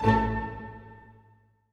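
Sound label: orchestra; Masterbits - Switch FX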